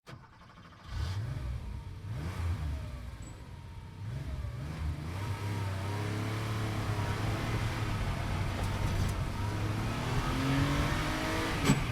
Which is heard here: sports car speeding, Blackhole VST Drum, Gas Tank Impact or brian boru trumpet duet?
sports car speeding